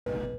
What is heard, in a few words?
beep